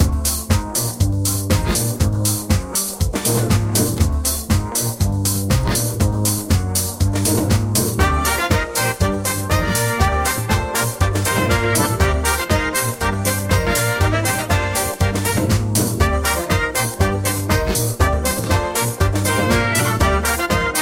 electro swing retro brass section swing rhythms electronic beats catchy playful danceable; nostalgic; AI audio; Stable Audio; Stability AI